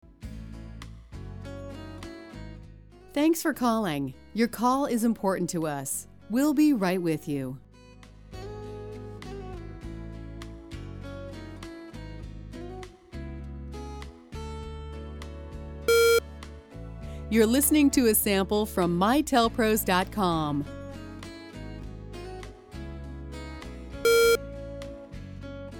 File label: Soft Acoustic Sample